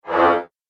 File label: Laser Energy Element